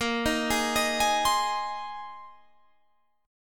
C Minor 7th Sharp 5th A sharp

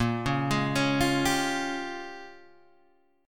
A sharp Minor 13th A sharp, Guitar chord; A#m13 Chord {6 4 6 6 6 3} arpeggiated

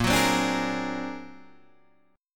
Bb Augmented 9th Bb, Guitar Open D

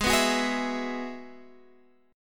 G sharp Minor 7th Sharp 5th G sharp; G#m7#5 strummed; x,11,9,11,9,12

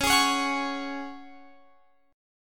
Db Suspended 4th, Guitar, Open F; Dbsus4 strummed; x,20,20,20,21,20

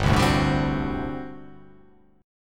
G Major 7th Suspended 2nd Suspended 4th G down

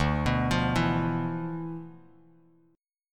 D Minor 6th Add 9th D, Bass Standard; Dm6add9 arpeggiated; JGuitar

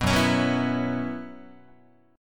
F sharp 7th Suspended 4th, Guitar; F#7sus4 strummed

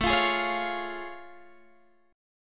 A Augmented Major 7th A Guitar Standard; A+M7 strummed

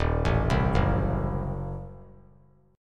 D sharp Diminished 7th D sharp; arpeggiated; D#dim7; 3,4,3,4; JGuitar

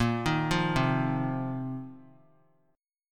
Bb Suspended 2nd Suspended 4th Bb; Bbsus2sus4 arpeggiated; JGuitar

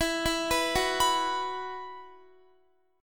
E Suspended 2nd, Guitar; Esus2; arpeggiated; x,19,16,16,18,19

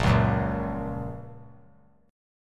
A sharp Minor Sharp 5th, down; strummed; JGuitar

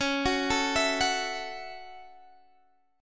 A 6th C sharp

